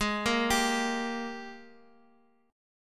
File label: Ab Major 7th Suspended 2nd, Guitar, Open F; AbM7sus2; arpeggiated; chordshape; x,x,x,3,1,2; JGuitar